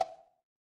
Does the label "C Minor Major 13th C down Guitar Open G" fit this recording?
No